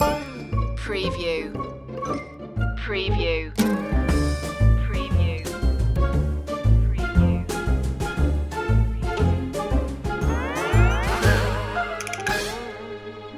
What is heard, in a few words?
cartoon kit jumping gnome